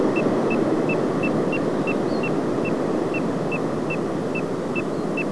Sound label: piping plover